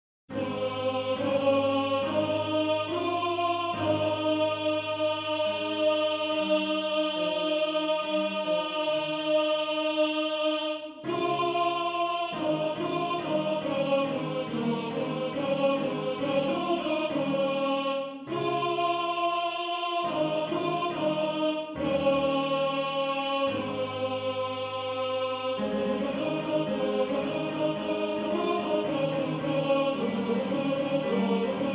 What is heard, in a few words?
Tenor; Tenor 1